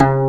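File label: gayageum D3, Korean; AKAI S6000 CD-ROM - Volume 1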